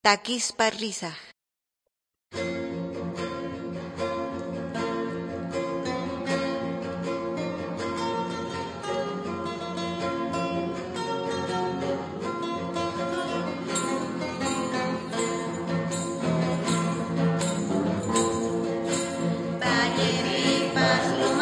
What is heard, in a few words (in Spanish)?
songs quechua norte de bolivia apolo